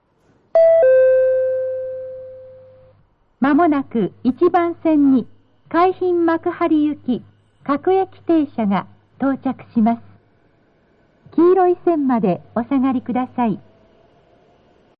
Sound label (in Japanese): maihama sekkin